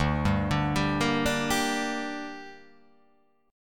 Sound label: D Suspended 4th Sharp 5th D down, chord